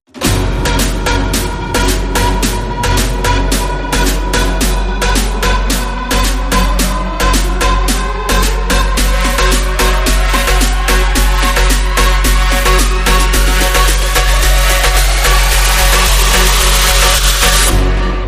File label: Moombah Trap